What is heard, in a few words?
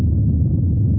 rumble